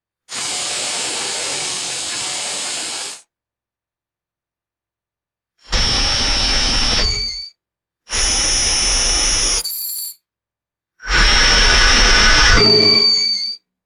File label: shimmering hiss and synthesized ringing bursts; magic-scifi